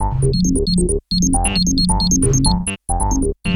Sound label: arp loop; Best Service ProSamples vol.10 - House; AKAI